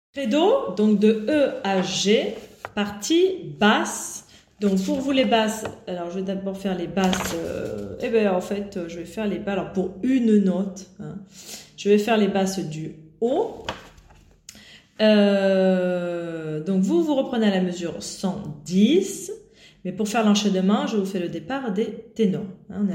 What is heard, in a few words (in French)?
basse du haut; Credo E à G